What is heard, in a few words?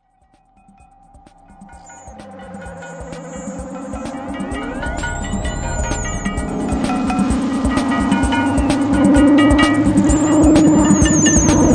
Live Mix Stupid Beat Noise Bird People Irish